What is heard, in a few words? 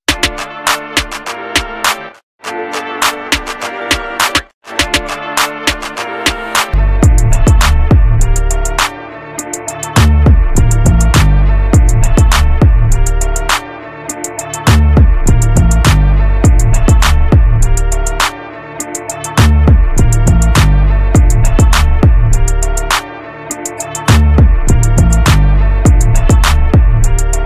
Instrumental